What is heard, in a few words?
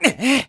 Ledge Climb; Link climbing a ledge from hanging